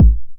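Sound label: 909 BD tape, kick drum; Goldbaby Tape808 & Tape909; The Tape 909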